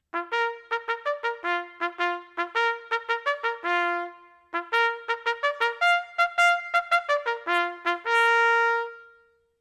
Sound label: Swimming, Bugler; Bugler and Drum Core